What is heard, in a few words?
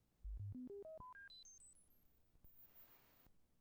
Toneburst (Dolby B); sdr-cassette